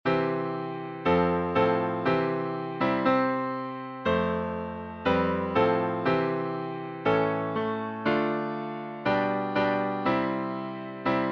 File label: Hymns